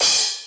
CHOKE, CYMBAL; drum

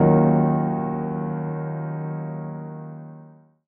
Bbm Keys, One Shots; Keys & Synths; Touch Loops; BlissedOutBeats

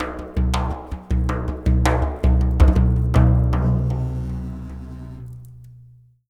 lick, ETHNIC STUFF

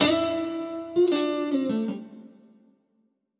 DEEPHOUSE GUITAR 125 A

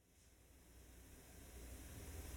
Shakers; One Shots; Touch Loops; CosmicFunk

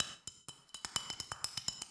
bottle, ethno, 125

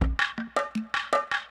PERCLOOP ETHNO 160

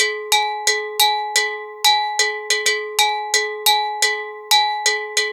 ETHNO EXTRA 90; ethno2

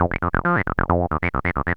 TB-303 LOOPS

